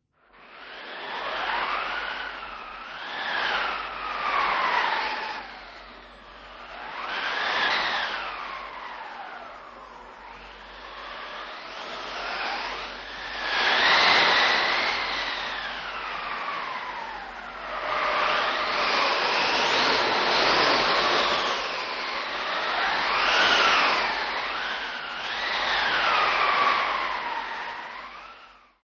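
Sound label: blizzard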